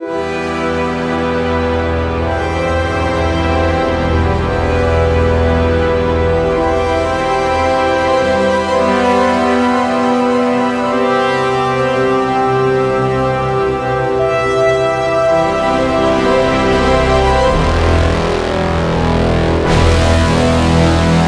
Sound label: Epic Brass Music, brass ensemble; zerog